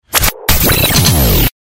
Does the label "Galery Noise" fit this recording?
Yes